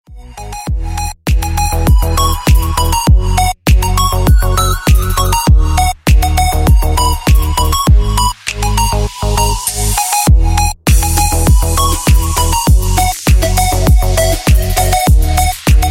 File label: tropical house